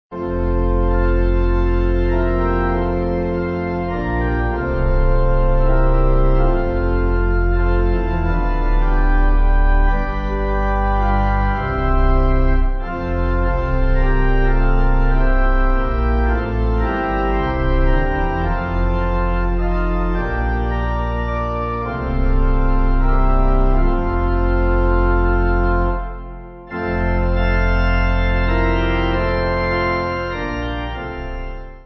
Organ

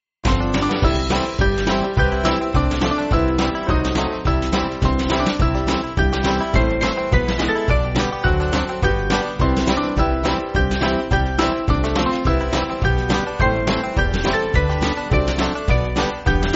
Band, Music Backing Files for Churches; Carr

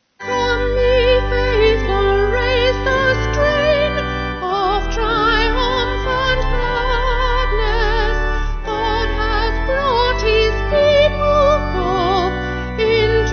Vocal Organ

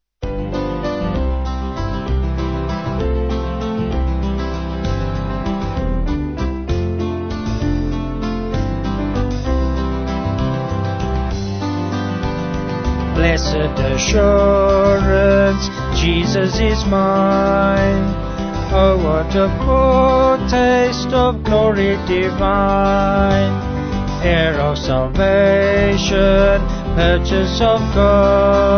Vocals Band

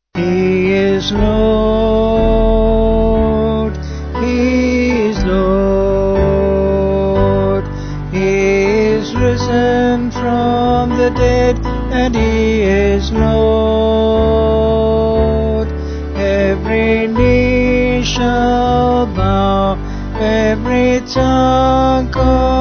Vocal Band

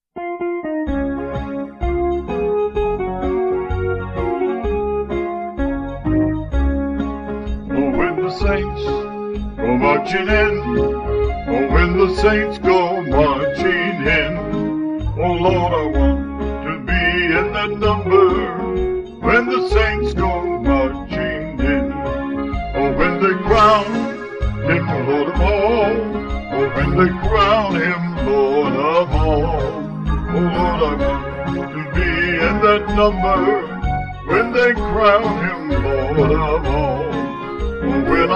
Vocals Band; O When The Saints

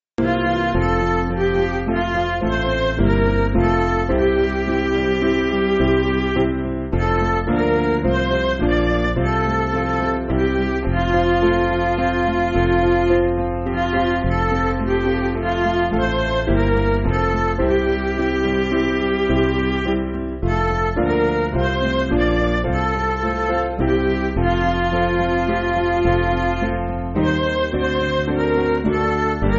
Author Of Life Divine, Wesley, Midi

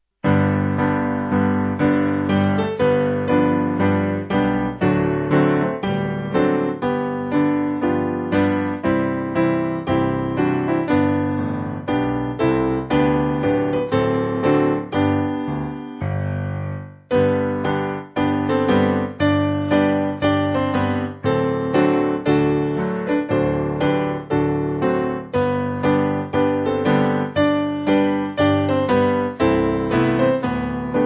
Piano

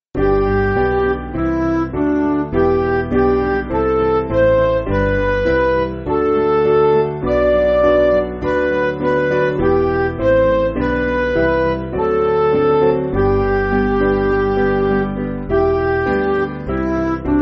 Bread Of The World, Midi

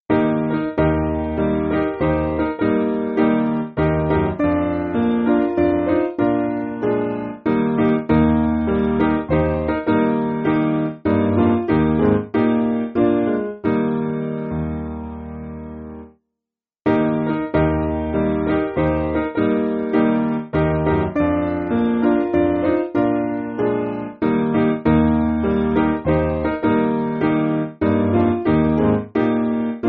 Piano; Eb

